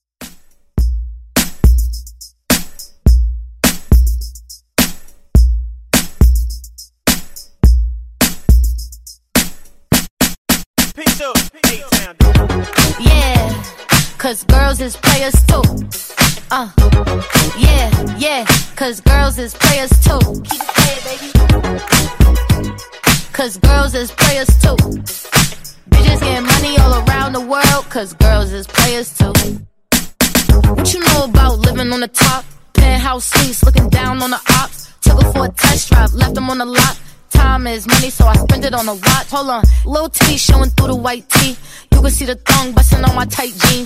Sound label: Re Drum 105 bpm